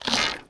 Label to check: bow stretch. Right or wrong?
Right